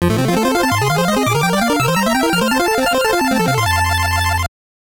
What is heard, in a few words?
Bonus; Isolated Warrior; NES